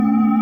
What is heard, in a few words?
Energy Loop